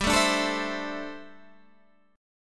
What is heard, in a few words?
D 9th Suspended 4th, chord